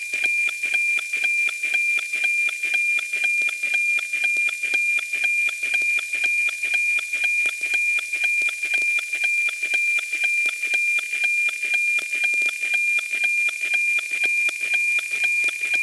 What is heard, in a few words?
NOAA APT